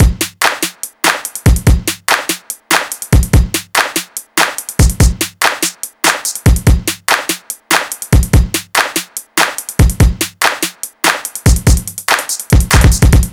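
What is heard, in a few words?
South Hip Hop Drums, left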